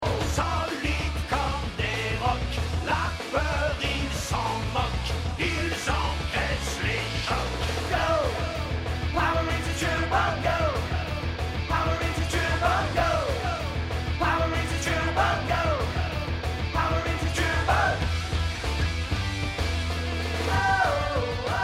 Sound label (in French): musique generique